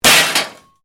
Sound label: METAL CRASH; Metal Sound Effects; Metal (Sound Effects:Materials)